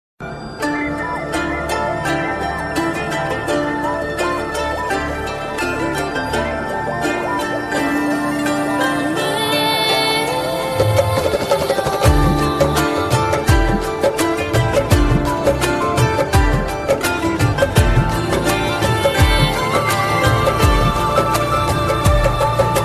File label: romantic love instrumental ringtone